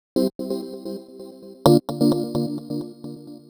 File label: Gated Synthline (130BPM); musicradar